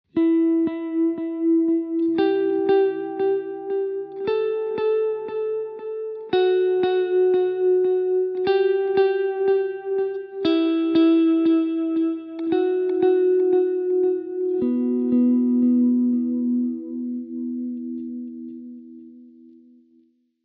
single note delay

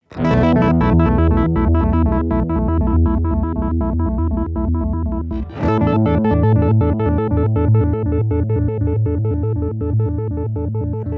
pitch mod trem

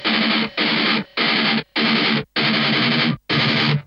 Triaxis

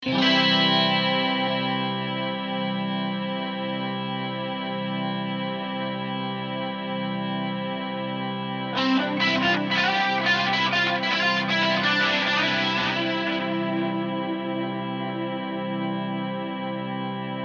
freeze

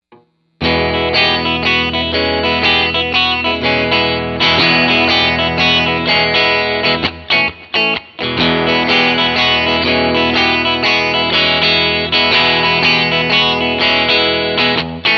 blues jr clip

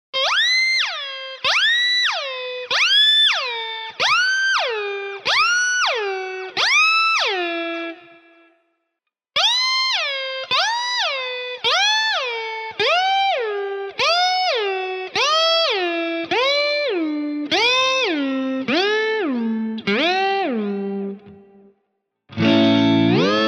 whammy 2

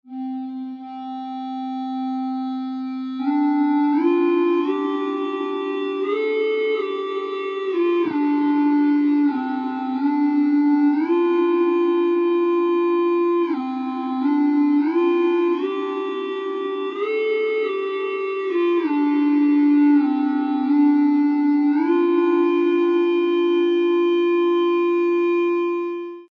fake ebow

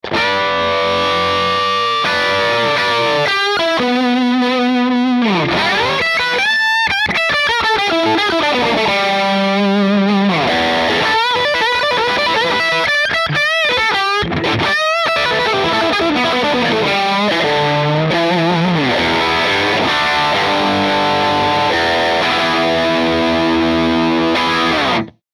AC30HW2 Top Boost Hot Mode, amp

